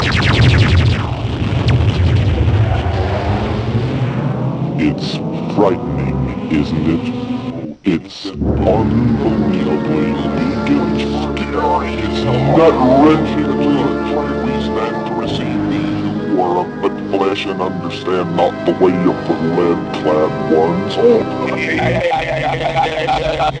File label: SCARY